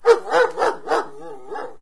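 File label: rural random dog big; random amb sfx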